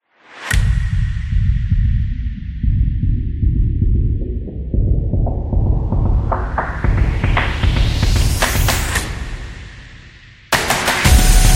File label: Fitness Center, football music